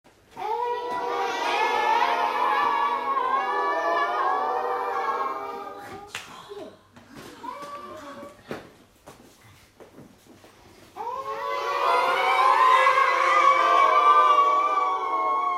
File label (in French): echauffements de voix